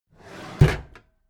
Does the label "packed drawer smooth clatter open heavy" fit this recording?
Yes